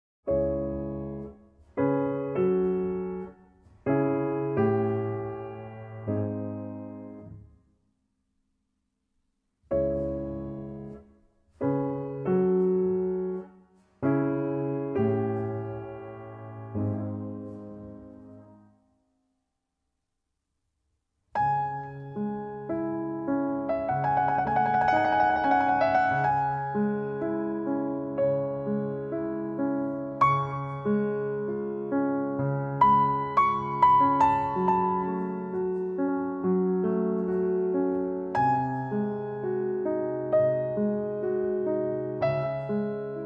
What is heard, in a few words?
Nocturne in C-Sharp Minor, Lento Con Gran Espressione